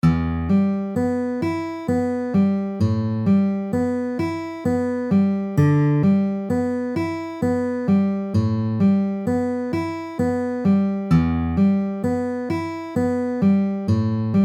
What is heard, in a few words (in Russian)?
перебор на открытых струнах